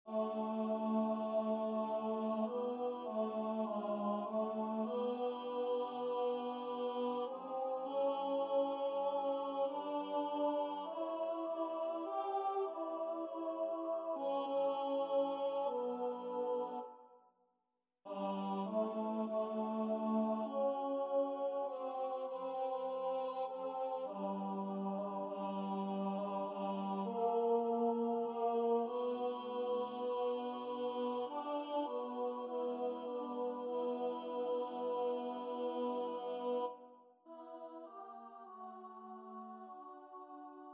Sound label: - ten